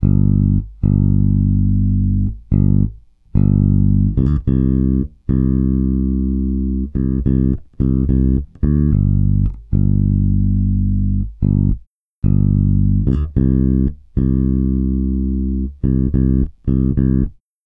Country Bass Intro; Digidesign; Pro Tools; Pro Tools Essential Demos; Essential Loop Demos; Demo Session - Country

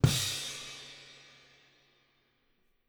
Country Drums Ending; Digidesign; Pro Tools; Pro Tools Essential Demos; Essential Loop Demos; Demo Session - Country

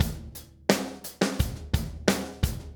087 Steady Grooves, Drums; Pop Rock; Moderate Tempo; Pro Tools Essential Loops; Digidesign

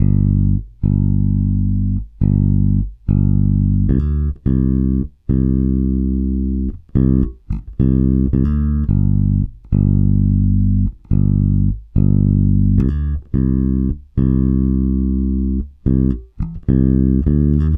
Country Bass Outro; Digidesign; Pro Tools; Pro Tools Essential Demos; Essential Loop Demos; Demo Session - Country

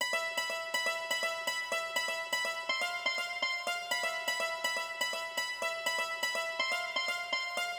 123 Dance Koto E; Dance DJ; Pro Tools Essential Demos; Digidesign; Essential Loop Demos; Demo Session - Dance DJ

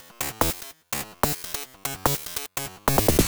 Techno Snare; 146; Dance DJ; Drums; Pro Tools Essential Loops; Digidesign

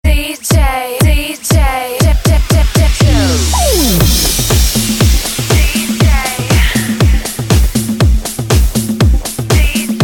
Club Mix

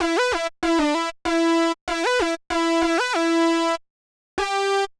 Latin HipHop Synth E min; Digidesign; Pro Tools Essential Loops

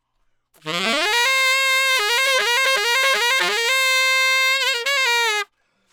150 Trad Jazz Sax Solo (3-4) Bbmaj; Brass; Digidesign; Pro Tools; Pro Tools Essential Loops

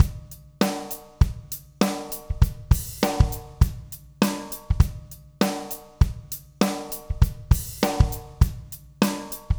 100 Pop Drums; Pop Rock; Moderate Tempo; Pro Tools Essential Loops; Digidesign

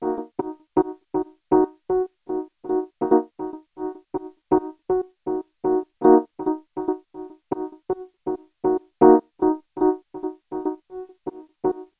W-Coast HipHop Ebm; Digidesign; Pro Tools; Pro Tools Essential Demos; Essential Loop Demos; Demo Session - Hip Hop

